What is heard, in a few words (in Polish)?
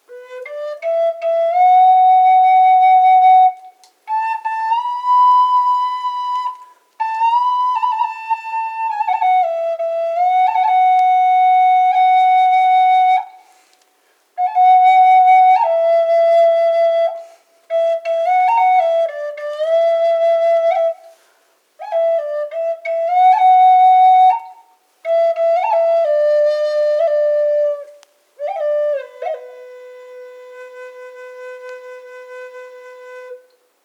Flet C